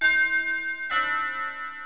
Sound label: two bells